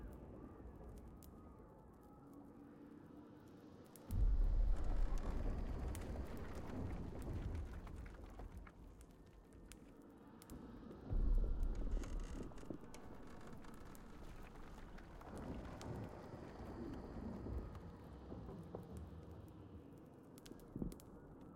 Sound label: amb demacia streets tavern